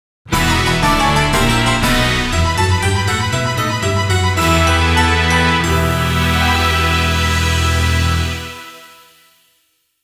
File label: Lose